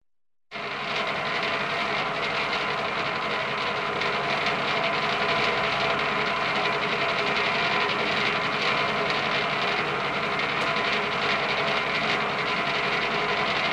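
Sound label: sawmill, saw running idle without sawing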